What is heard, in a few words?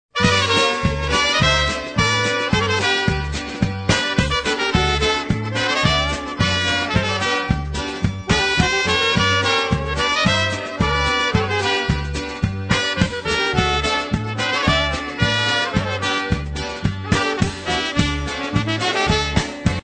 instrumental